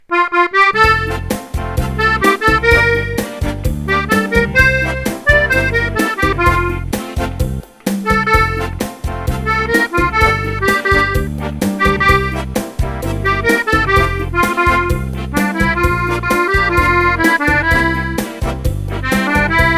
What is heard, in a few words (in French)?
chacha